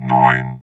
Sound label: VOX VOX, Electro Vox